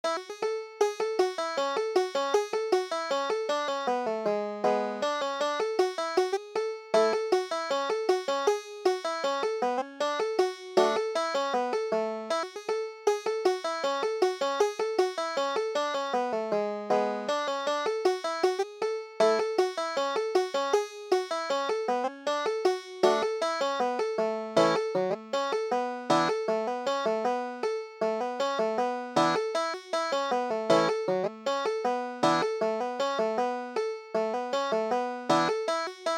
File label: three thin dimes, banjo